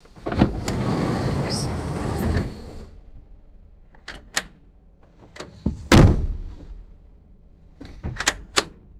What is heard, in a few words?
heavy train window opens and slams shut; glass